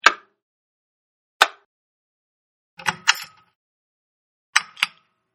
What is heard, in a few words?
Light switch, Light Switch Flipped On And Off, Light Switch With Chains Turn On And Off; Household